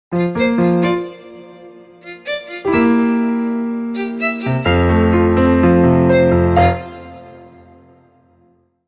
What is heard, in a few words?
Psychedelic Golf, Sting 1